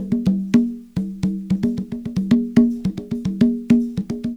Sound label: con; Percussion